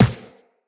Run Default, Footsteps; Tenno

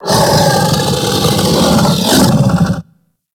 Kubrow Tame Sleep Idle Yawn I; Warframe

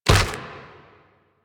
Proto Infested Runner Step Default; Warframe

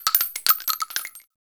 Pistol Shell Droppings; weapons; Warframe; Grineer; Orokin

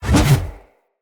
Spear Spin Whoosh; Weapons; Enemy; NimrodSpear

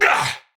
Attack Effort, Battle; Warframe; Tau; Dax; Knight

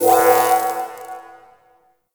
Mission UI Open; Warframe; Zariman

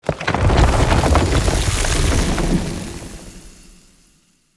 Root Growth Bridge; Puzzles; BeanStalk

